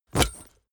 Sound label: leather metal bodydrop